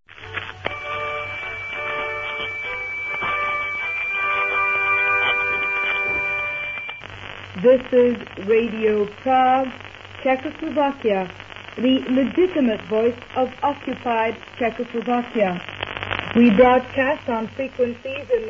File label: interval signal; Radio Prague external; Radio Prague forward left interval signal